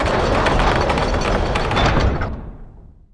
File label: Door Large Wood Castle Close; Doors